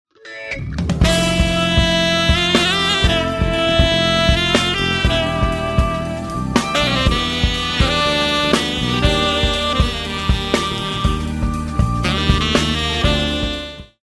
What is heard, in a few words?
lost round; music kits custom